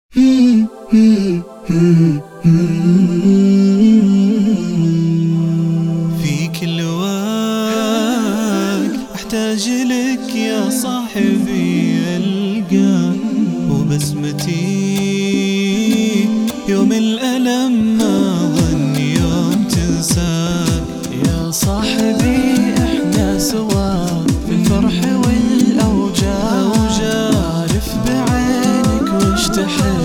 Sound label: Percussion; Samaa Network